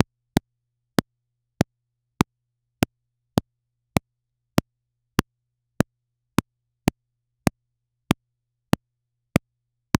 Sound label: create the sound of perso, falling